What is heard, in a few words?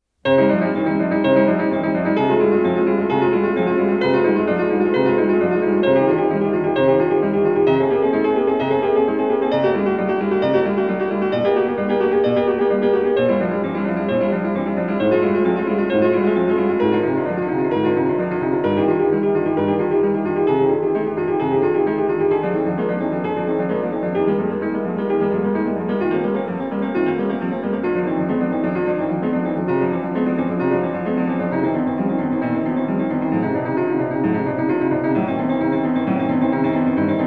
Prelude in C minor BWV 847